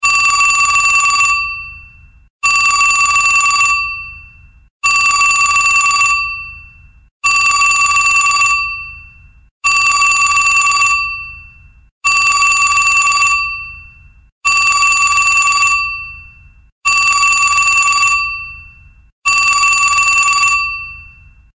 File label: Vintage Telephone; SU640 Optimus LTE; ringtones; LG